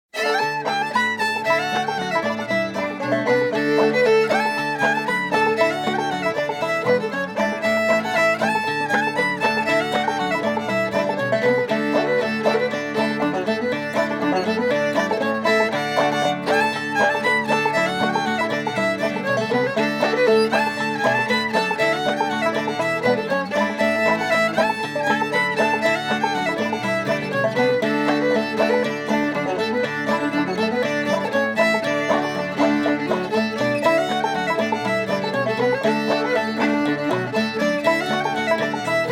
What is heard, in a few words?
Banjo Tramp, old time fiddle tunes